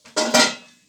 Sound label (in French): Cloche couvercle vlam plam